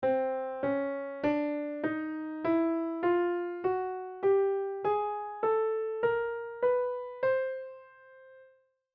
Alle Toene

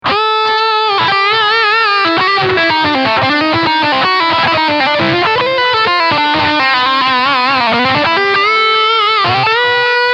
Steg Humbucker Lead

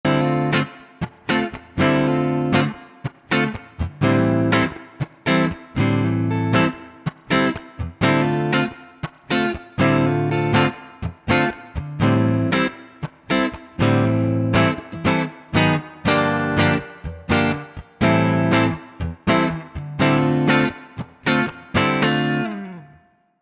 Floorboards im Vergleich Clean Headrush Core Prime

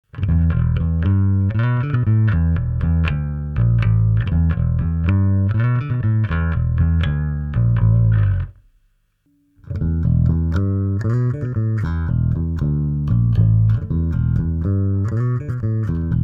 Ashdown DI und Mikro

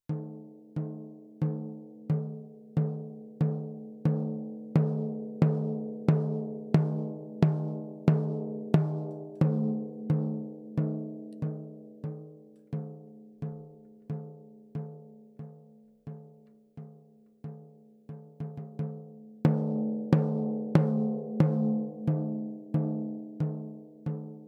14x8 Mahagoni Snare Recordings Tief

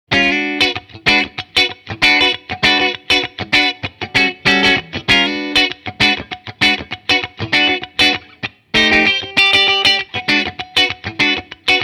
Mitte clean; Larry Carlton T7 AWH

